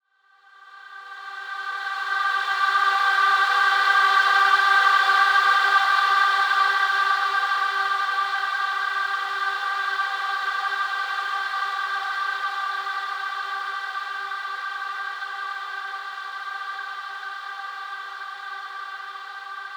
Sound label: Summer Choir Ahh